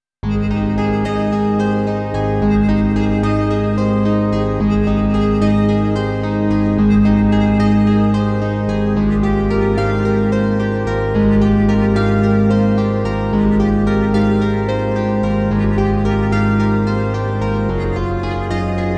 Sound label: Nord G2 Multimode